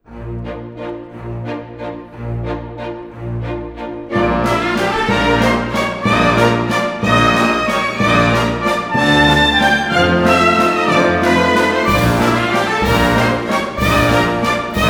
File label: Grand Allegro (Solo); Swan Lake